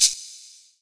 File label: Maraca; realdrum